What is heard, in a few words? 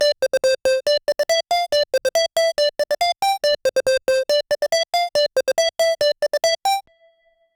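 square and xylophone mixed, 140 bpm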